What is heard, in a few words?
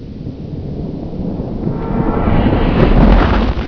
port suckout, ambience; Source Engine; hl1